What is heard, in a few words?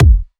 Bassdrums